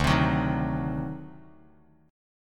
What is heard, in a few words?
D 7th Flat 9th D down Bass Standard; D7b9 strummed; JGuitar